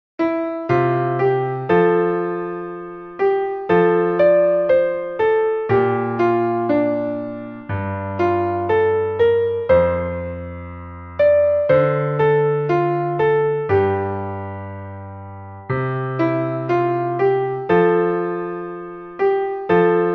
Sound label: simple bass; londonderry air